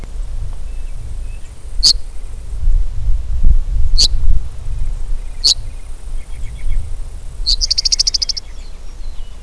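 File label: Eastern meadowlark call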